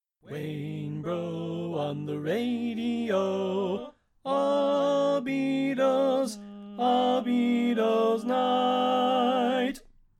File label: Bari Left; Pennsylvania Groups